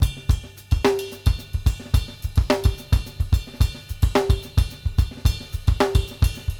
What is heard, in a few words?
6-8 GRVE, 216; Sampleheads; New York City Drumworks VOL-1